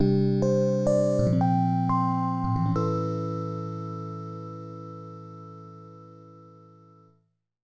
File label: Mood Time Pass; house m.d.; nds